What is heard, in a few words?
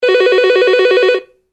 funny ring